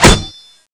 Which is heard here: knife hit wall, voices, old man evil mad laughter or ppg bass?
knife hit wall